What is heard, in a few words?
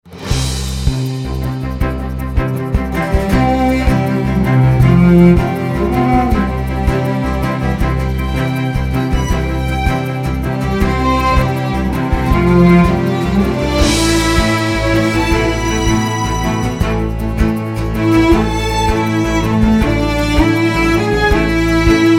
Android Ringtone